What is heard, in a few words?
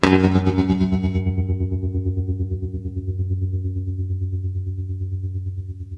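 tremolo F#1, guitar; East West; Steve Stevens Guitar Samples Collection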